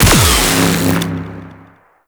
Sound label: Shot, Arc Rifle; Coda Synapse; Infested; Weapons